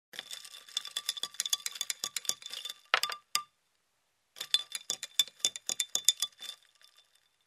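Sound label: spoon in a cup sound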